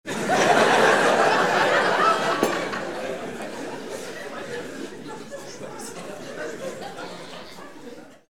laugh table noise

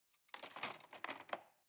cutlery cake forks drawer place soft

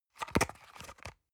paper box small handle medium

